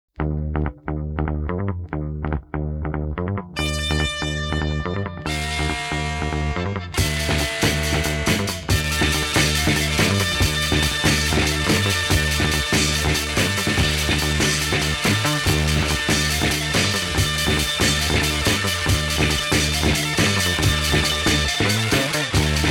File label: instrumental